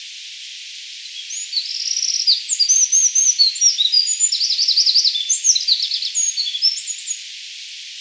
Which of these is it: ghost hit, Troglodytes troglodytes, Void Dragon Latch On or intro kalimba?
Troglodytes troglodytes